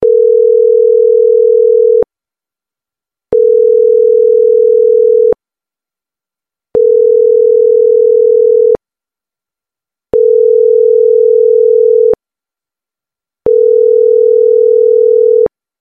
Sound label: Ringing Tone